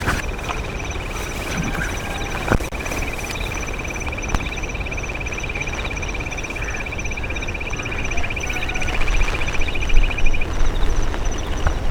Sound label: Jungle Bush quail